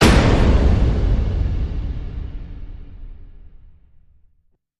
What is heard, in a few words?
hit